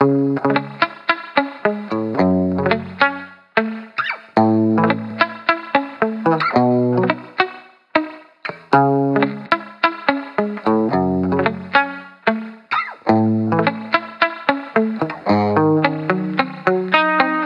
Guitar Bpm110 Key C# minor, 70s Guitars; Fox Samples; Back to the 70s Guitars

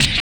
RSS, 160 BPM

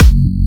Jump Kicks, Bassdrums

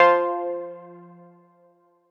lead chordy F; leads

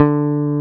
D3, BASSES, Roland

